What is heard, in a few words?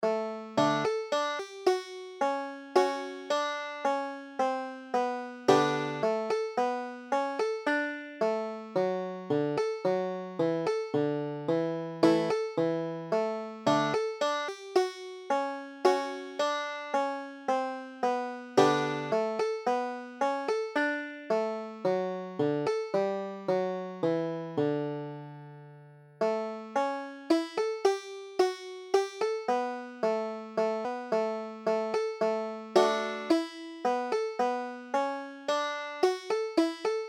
waltz, banjo